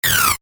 Minimise, Effect To Accompany Minimizing Of Window Or Program; Transitions; Multimedia